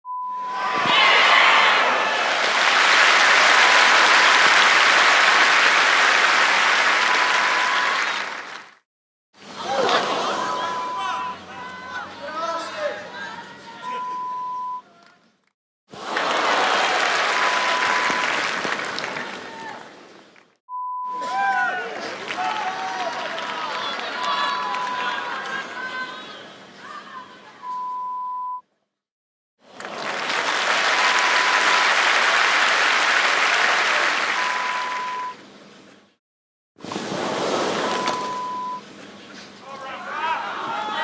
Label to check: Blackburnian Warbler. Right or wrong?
Wrong